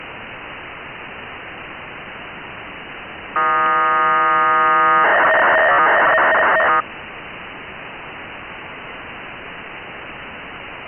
QB50P2 1k2 bpsk 145880

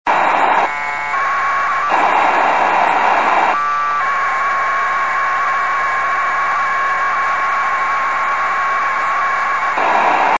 afsk

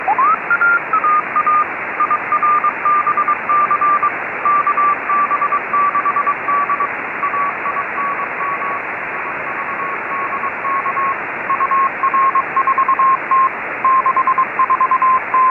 cw beacon 145mhz907; xw-2e

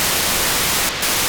SNUGLITE 437,275 9k6 GMSK (FM), 1x short beacon

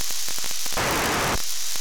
HO-113 435,725 4k8 GMSK beacon; CAS-9, XW-3

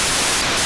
Norbi 436MHz700 9k6 GFSK beacon, satellite; On September 25th 2022 at 12:49 UTC Daniel DL7NDR received on 436.700 MHz the 9600bd FSK b

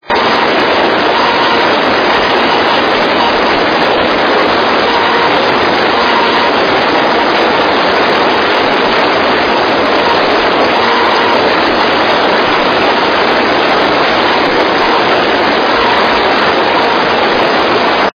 nfm demodulated; PRISMA; These recordings were made on August 16th 2010 at 16:45 UTC on 400.550 MHz by DD1US.

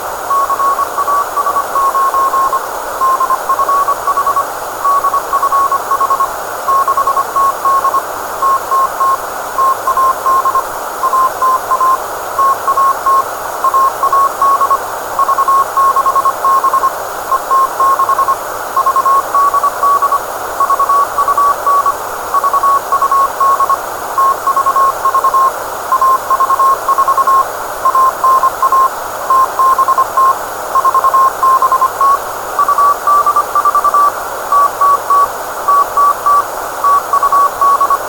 cw beacon; On February / 6th2022 around 11:55UTC Branko / 9A3ST in Labin/Croatia received the CW beacon from HO-113.

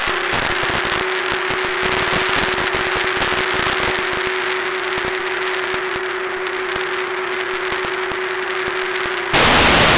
PSK31; BRICSAT

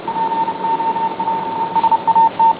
CW; POPSAT; recording of the CW downlink signal of POPSAT-HIP1 was / kindly provided by Giulio IV3DTB/9V1FC

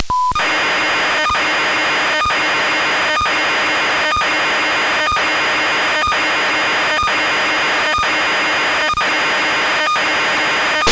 telemetry 465 mhz; signal from RASAD-1 was received on June 19th 2011 at 10:30 UTC on 465.000 MHz

